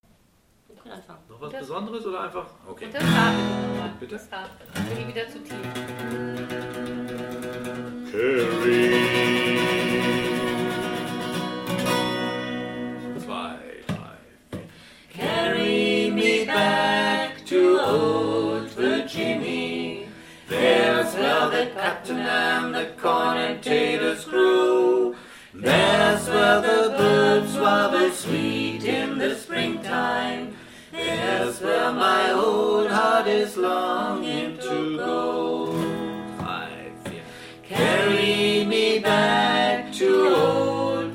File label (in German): refrain fast fertig; carry me back to old virginy